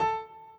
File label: piano intro